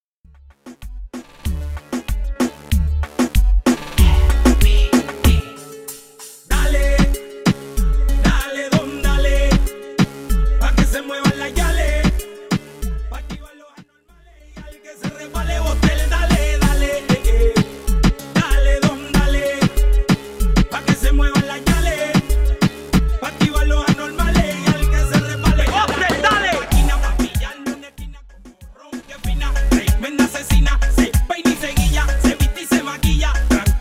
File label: Intro 95 BPM; ESSENTIAL TOOLS, ONLY FOR DJS